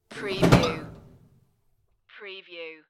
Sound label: open locker door